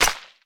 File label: Disc Rico Stone; Boomerang Fu